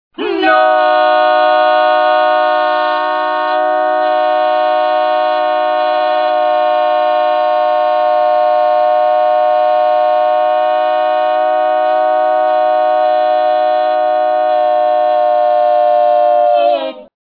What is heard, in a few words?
NOOOOOOOOO HARMONIZED